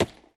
symbol Stop On Stone; netent; eldorado

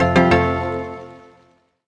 medium Win; netent; happyriches

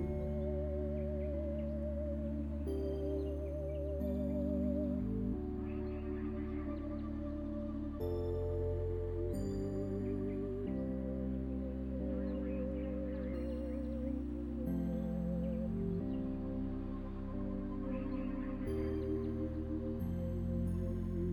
ambience General; netent; whenpigsfly

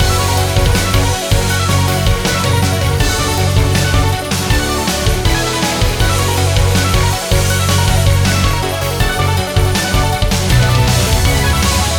big Win Loop; netent; neonstaxx

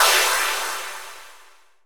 win Attention; netent; reelrush

